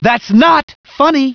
hurt soldier

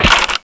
door locked

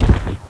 bodydrop

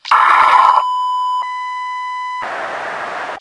die, metrocop zombie; scmod

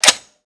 m16 cock

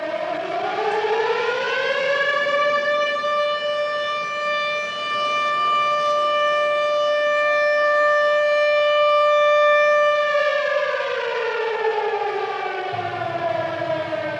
siren

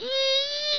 cock wrench; mario; supermariobros

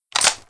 mp5 empty mag in

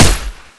m4a1 shoot

